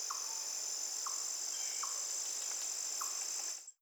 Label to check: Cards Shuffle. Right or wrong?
Wrong